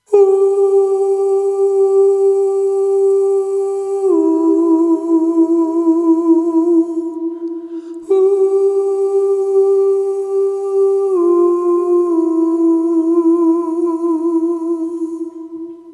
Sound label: EQ4M Vocals Original